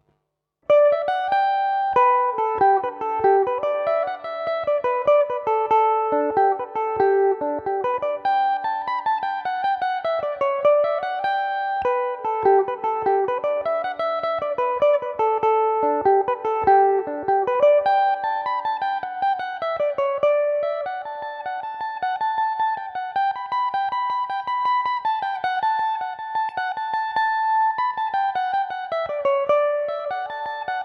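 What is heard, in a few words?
G major, Irish mandolin; Gillan’s Apples; Belmuse EM-190 electric mandolin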